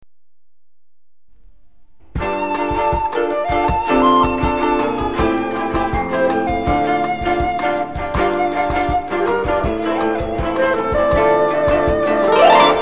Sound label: koto